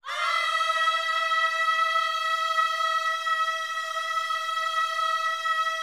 OHS E 5, gospel; Spectrasonics; Vocal Planet Vol.1 Gospel; akai

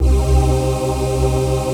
VOICE PAD, ATMO PADS; Best Service; XX Large

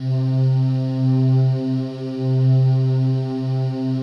PAD, Pads; Best Service; XX Large

